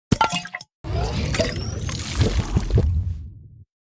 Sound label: slurp juice; fortnite